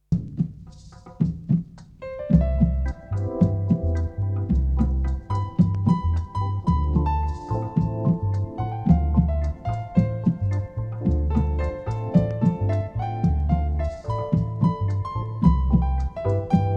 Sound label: MUSIC JINGLE